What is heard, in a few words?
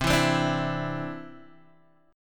C-Major Add 9th, Guitar DADGAD